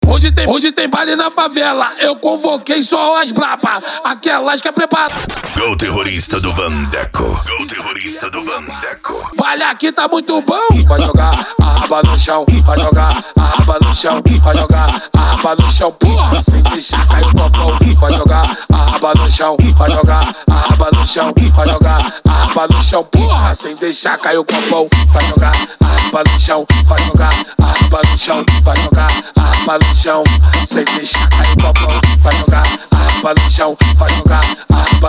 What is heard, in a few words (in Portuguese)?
dance e funk mega bass